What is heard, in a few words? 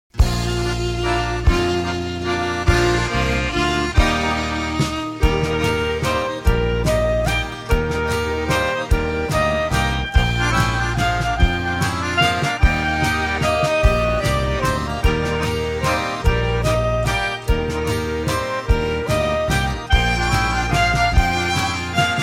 blackhawk waltz (instrumental)